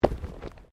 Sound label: Body movement Impact Roll; Body movement foley to simulate falls and deaths; Rolling; Objects